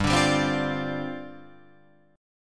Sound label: G 7th Suspended 4th G down Guitar Open F